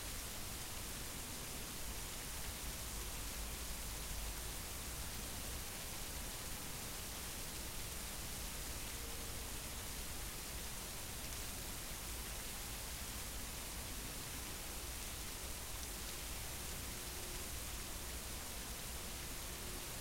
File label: rain